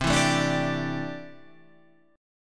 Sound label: C 6th Add 9th C